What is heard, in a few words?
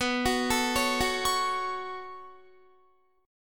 B 7th Suspended 2nd, Guitar; B7sus2; arpeggiated